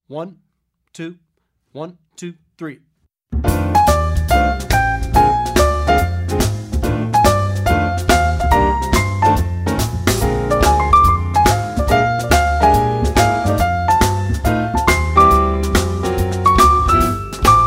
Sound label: Jazz Piano Blue Note Blues with piano soloist; Effective Etudes For Jazz Piano